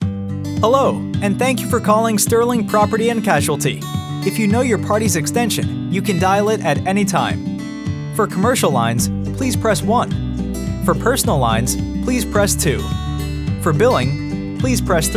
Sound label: Main Greeting Music; IVR greeting (auto attended)